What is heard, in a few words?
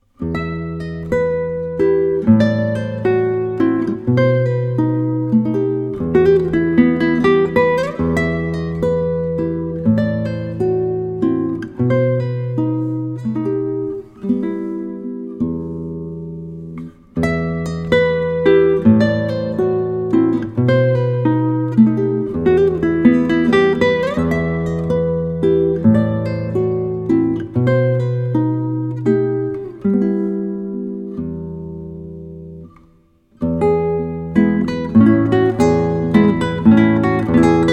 Romantic Guitar, Spanish guitar